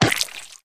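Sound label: free spins hit heavy forest add; wild worlds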